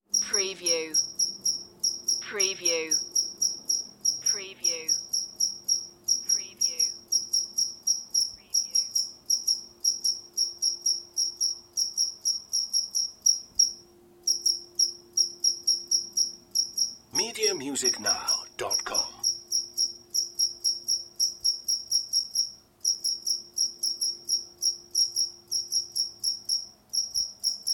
single cricket chirp

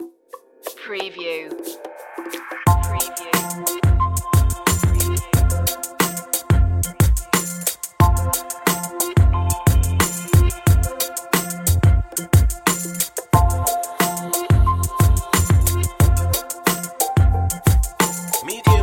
electro hip hop

